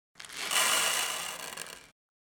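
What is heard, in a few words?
sound of scattering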